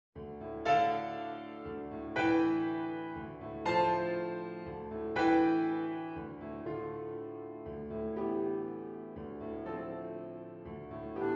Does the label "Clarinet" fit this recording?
Yes